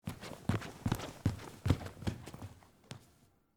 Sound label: Stage Floor Run, Footsteps, Stage, Floor, Run, Across, Wood, Surface, Theatre; Footstep Sound Effects; BlastWave